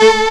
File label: noise, FX & ATMO, ALARMS & ALERTS; Techno Trance Essentials